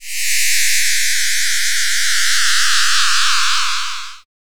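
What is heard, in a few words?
synth, Production Effects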